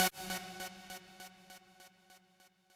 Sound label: lead